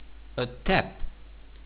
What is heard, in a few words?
tap